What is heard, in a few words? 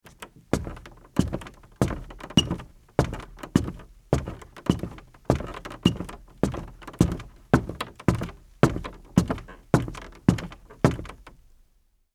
Footsteps Sneaker Wood Up